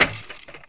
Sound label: shoot